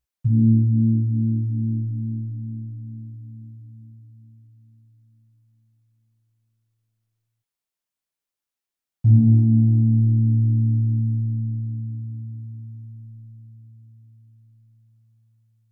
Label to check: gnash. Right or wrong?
Wrong